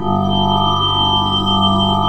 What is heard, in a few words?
G4 turbine; NorthStar - The Wizard VOL-2; HEAVY WATER